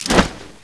357 holster

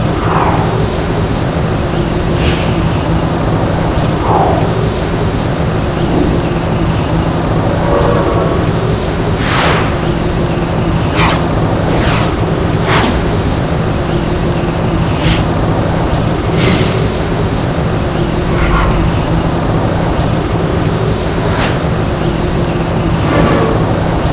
tram exterior loop

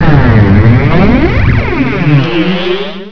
manta flyover

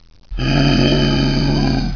kp attack

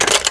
pickup; 50BMG

